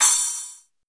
Splash CYM; SN-R8-11 Metallic Percussion

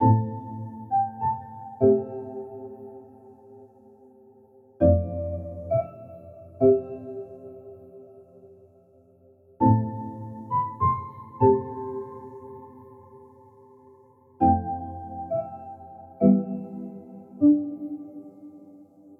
Mallet Loop 100BPM Amin; 99Sounds; Monosounds; Music Loops; Instrument Loops

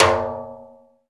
RIMSHOT, Timbale; Timbale Battery

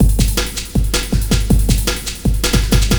LOOP, Eurotech; Sounds Good

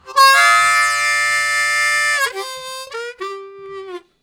Fading Grind, Harmonica; Zero G

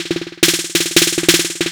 Fill, Drums, Eclectic Electronica

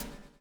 BUBL; Spectrasonics - Backbeat

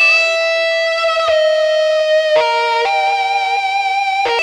xtra lead

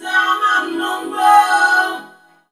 MAY NUMBE, VOCODER, Funky Vocals; USB Soundscan vol.18; AKAI

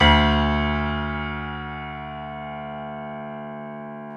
PNO XYPNO, Grand Pno, Roland

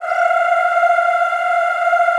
F4, Extended Classical Choir; Best Service

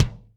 Kik Loose One, Drum Machines Alesis D4

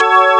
pluck organ; Amiga Soundtracker Sample Packs

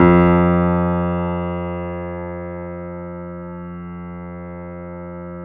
F 1, GRAND P; USB Soundscan vol.17

